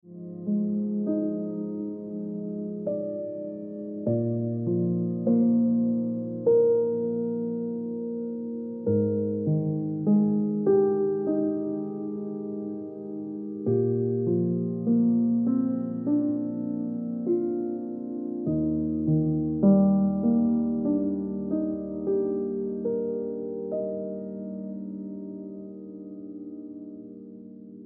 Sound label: Ringtone Piano Music